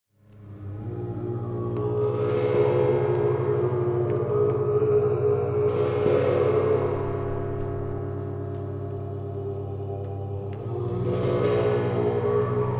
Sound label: throat